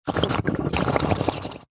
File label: cartoon slime bubbles; zapsplat